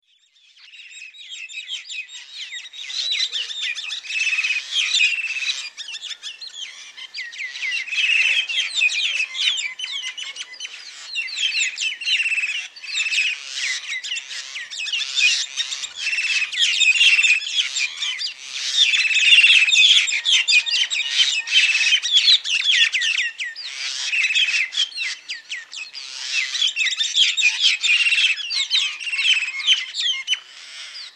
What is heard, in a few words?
myna common, bird calls